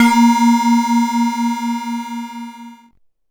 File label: BELL-GONG, A#; Big Fish Audio